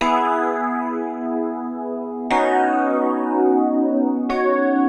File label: Synt; FUNKACID3